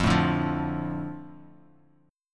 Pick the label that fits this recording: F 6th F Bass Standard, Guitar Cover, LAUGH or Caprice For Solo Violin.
F 6th F Bass Standard